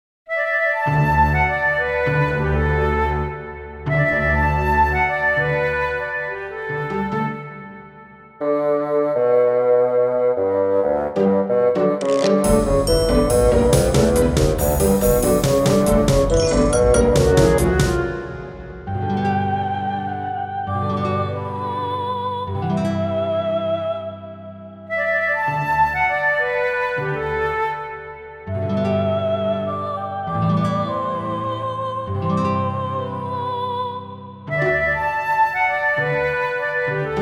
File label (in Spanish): musica incidental; para cuento cejov